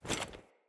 Foley Action Armour Jump Land; Player; Deora Armour

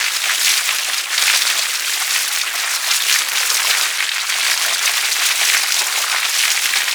Ink Rifle Base Hit Effect Loop, Weapons; Warframe; Tenno

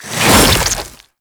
Whoosh, Weapons; Infested; Coda Pathocyst

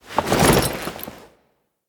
Idle Screen Foley Fast; Kuva Lich

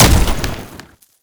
Hit; Warframe; Uriel; Infernalis

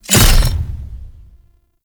Machete Finisher Slice; Sentient; Warframe; The New War; Kahl; Weapons

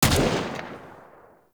Braton Shot, weapons; Warframe

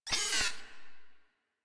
Proto Infested Mutalist Pain, Vocals; Warframe

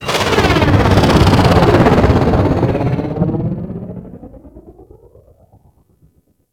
Fortress Ship Down; Ambience; Gameplay; Warframe; Grineer

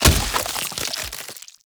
Tree Seed Splat; Ambience; Gameplay; Warframe; Infested Eris

